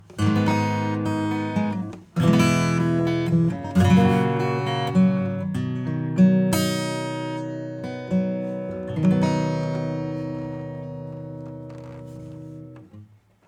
acoustic; SplitEQ

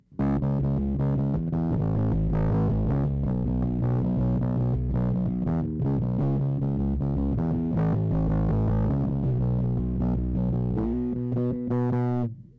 Bass Clean; TLA-100A

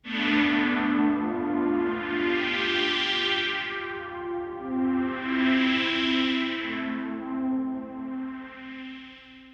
Nord Lead 4

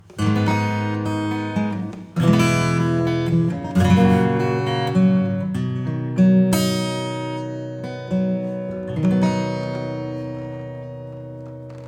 reverb acoustic Guitar

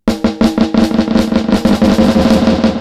Delay TRIPLET; Cluster

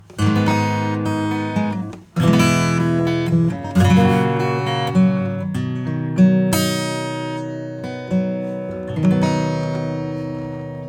acoustic; Universal

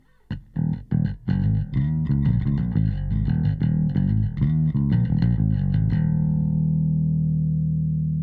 bass; Xtressor

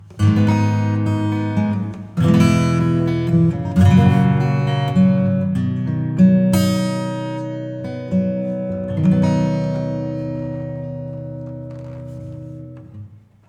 Acoustic; Reverb Compare; Seventh Heaven Professional

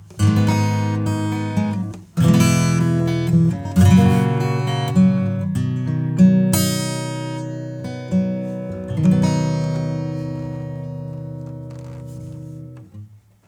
acoustic; Oxygen

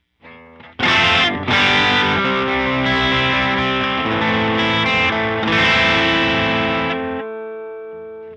Eguitar default

